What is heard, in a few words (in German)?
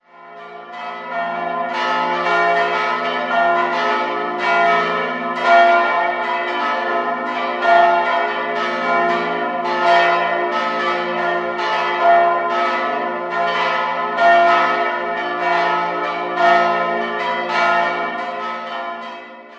Glocken, Pfarrkirche St. Michael; Eitlbrunn; Eitlbrunn findet man einige Kilometer westlich von Regenstauf.